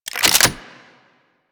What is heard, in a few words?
Reload, Weapons; Warframe; Boar